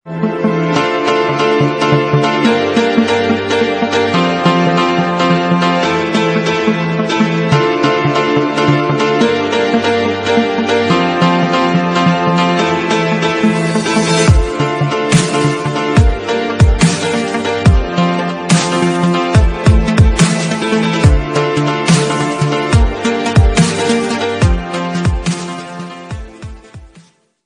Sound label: Mobile Ringtone